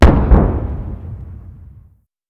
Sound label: Vehicle Weapons